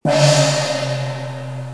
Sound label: WIND GONG